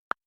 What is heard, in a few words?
typing sound